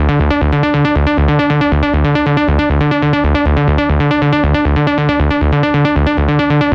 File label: Vixy D 142 BPM, Psytrance Arp Loops